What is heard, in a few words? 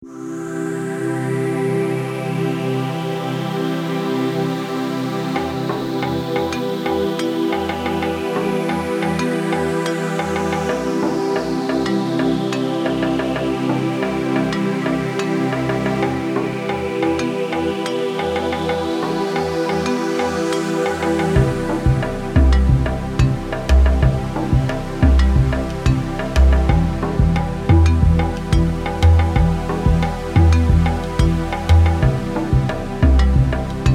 Lounge, Ambience Mix; Adriatic Sea